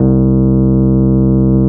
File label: RHODES